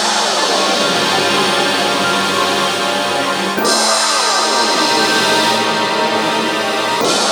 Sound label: Keys movie fx; Sonic Foundry (Sony Creative Software); Ugly Remnants VOL-2; UR 2 FX (Long)